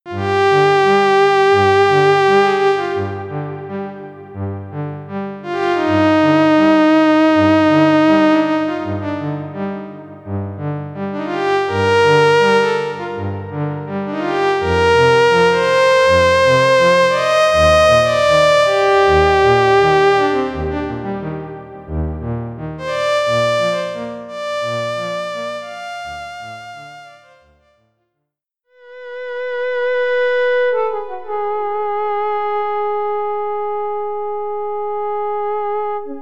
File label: Juno-60 Solo